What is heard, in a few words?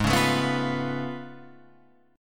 A Augmented Major 9th G sharp